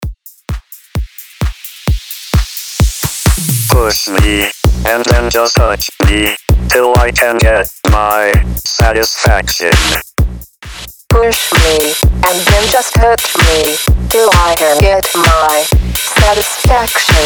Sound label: intro outro 130 bpm